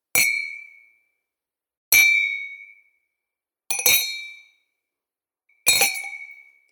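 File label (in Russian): бокалы с шампанским 4 варианта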